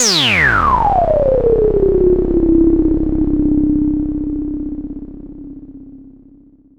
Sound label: SYNTH FX